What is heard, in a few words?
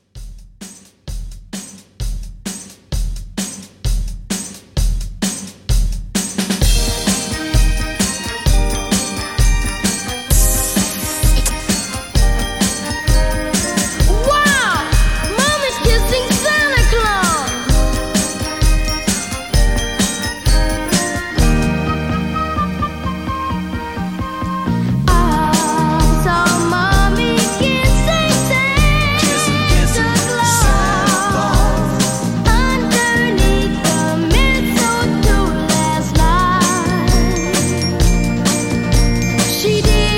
70s Christmas Re Drum, 130